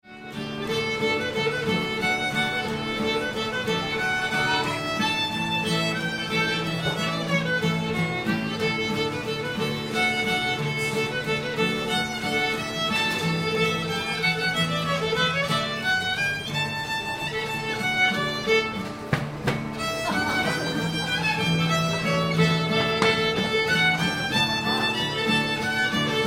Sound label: german stop waltz